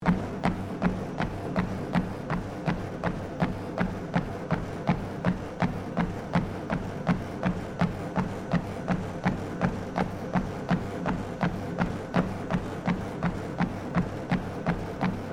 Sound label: GYM TREADMILL JOGGING 10KPH LOOP; Various gym machines; Equipment; Sports